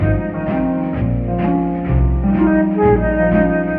strings and flute anything goes, drum loops, funky